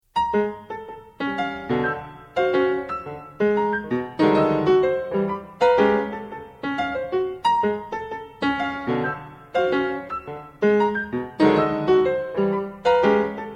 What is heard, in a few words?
Variations for Piano Op27 - Sehr schnell; Webern